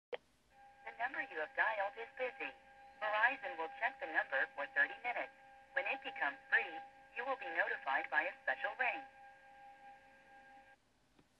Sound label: busy redial, Verizon POTS; Busy Redial *66